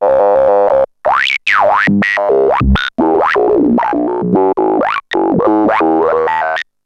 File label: TALKING MIDI, SFX, TECHNO; Syntec; Wall of Sounds; TECHNO-BOX